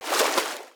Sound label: splash; Nick Summer Sports Stars